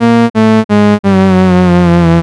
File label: womp womp